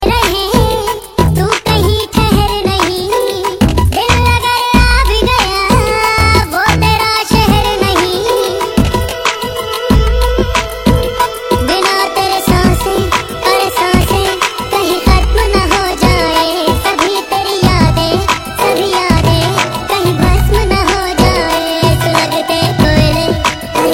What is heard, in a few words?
flute